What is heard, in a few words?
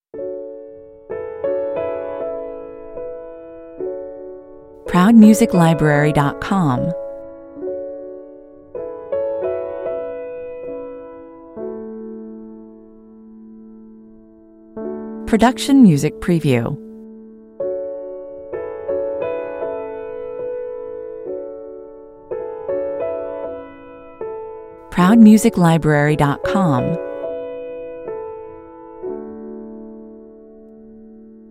piano only